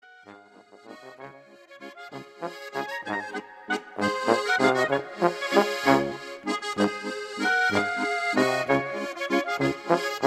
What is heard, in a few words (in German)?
Walzer